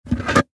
Traffic Cone Up, Traffic, Cone, Pick, Up, Orange, Road, Cone; Industry; Traffic And Car; BlastWave